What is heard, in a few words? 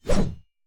Blade Swing; Scrap Weapons; Zomboid